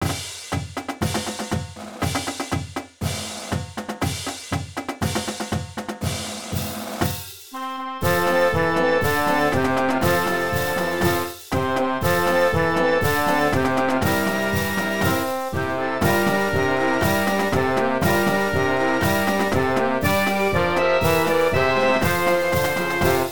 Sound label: Fight Song arranged, marching band